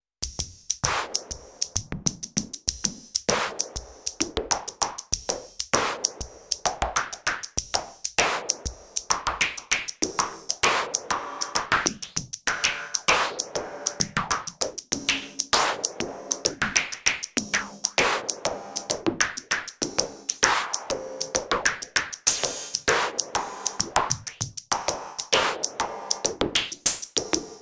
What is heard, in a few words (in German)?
7X7 neue ACB Drums moduliert